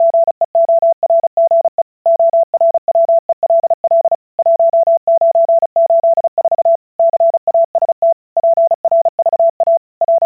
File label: 35wpm, cw; vyuka cw